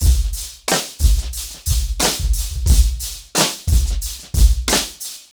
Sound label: 90BPM, acoustic drums; The Roots Drumloops